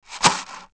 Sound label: drum